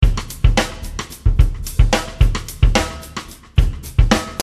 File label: Shuffle